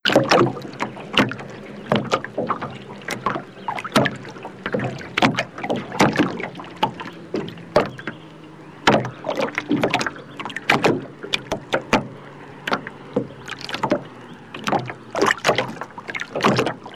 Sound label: R44 Clapotis, Robinson